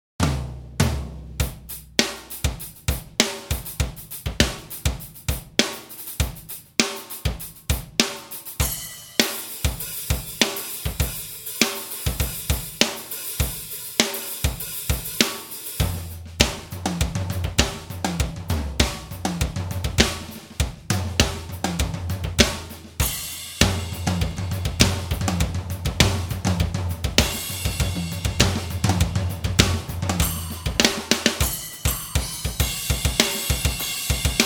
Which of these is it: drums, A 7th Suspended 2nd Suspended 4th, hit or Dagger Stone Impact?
drums